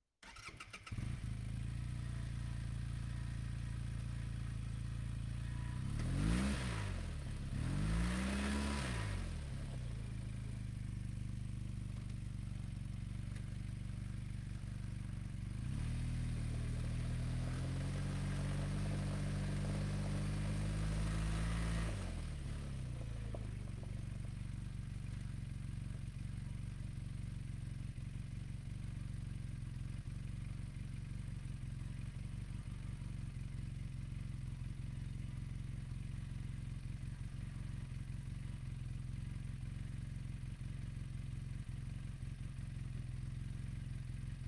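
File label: textron wildcat sport onboard slow drive various engine; mkh8020; Sonniss GDC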